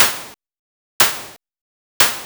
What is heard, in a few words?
POLYMOD; AMG - Vince Clarke Lucky Bastard